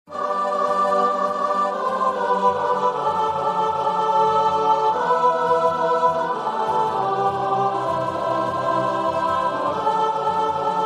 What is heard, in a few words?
All